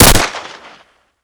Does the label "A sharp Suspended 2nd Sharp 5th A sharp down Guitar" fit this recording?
No